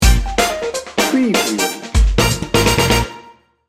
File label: feel good sting bumper